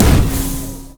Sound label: overdrive; LoL; blitzcrank iblitz